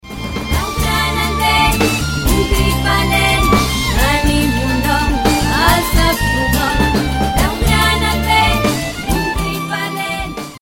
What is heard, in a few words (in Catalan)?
himne